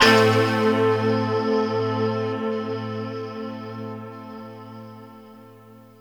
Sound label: Pad